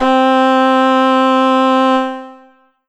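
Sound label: Roland D-20 Cellist C4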